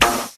juicy steel snare drum f key, acoustic snare tuned to the F note; 90s; snare drum samples